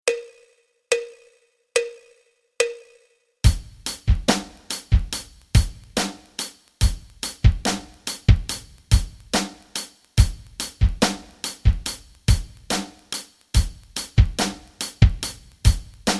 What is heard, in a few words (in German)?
rock rhythmen